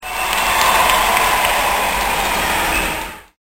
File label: cheering